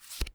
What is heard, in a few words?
Panel Admin Card Move; Among Us